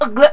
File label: gurp; quake2